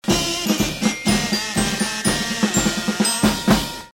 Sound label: feedback success